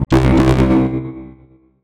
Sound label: Boom boom